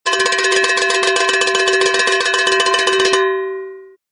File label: bell cowbell rattle